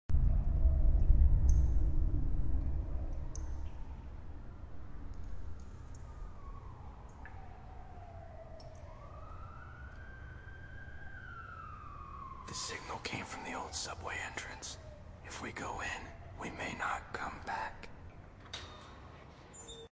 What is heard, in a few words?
cinematic sound scene